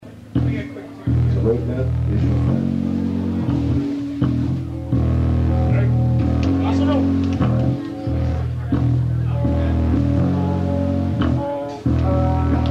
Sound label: patter happy birthday; dentist